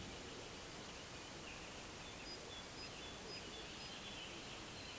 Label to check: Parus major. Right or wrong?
Right